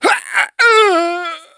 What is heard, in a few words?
death